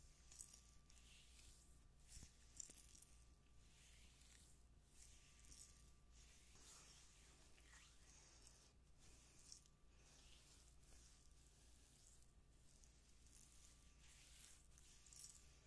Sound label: Unused SFX